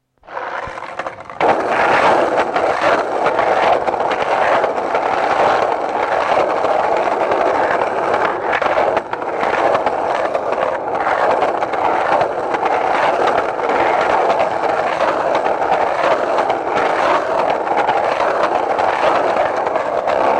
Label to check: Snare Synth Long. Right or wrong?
Wrong